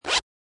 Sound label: Zipper Zip Up; Zipper or fly being done up